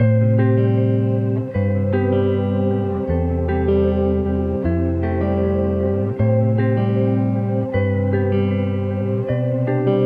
155 BPM A Min, Guitar; Cymatics; ZODIAC Beta Pack

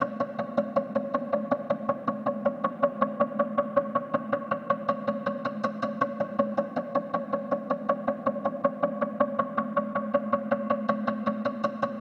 Percussion

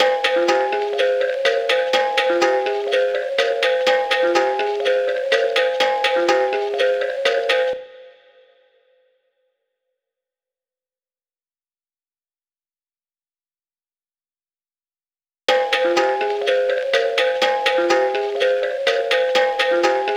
124 BPM F Min Kalimba Low; Melody; Loop Stems; Cymatics; 2022 Melody Collection Platinum Expansion - Pt 1; Circus Trick

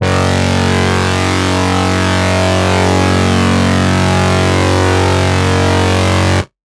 Lead Shot F# Darkness; Dubstep; One Shots; Ghosthack; Dubstep Sound Arsenal

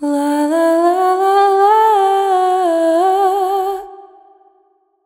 Lalala, Vocals, Wet; GhostHack

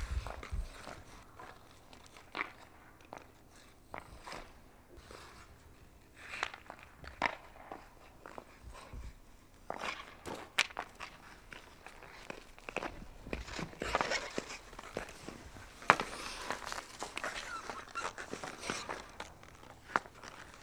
Hockey Skate Movement; Sports & Recreation

